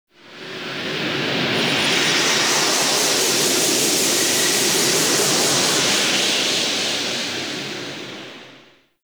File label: Exterior Windy Passby, Ambience; Warframe; Railjack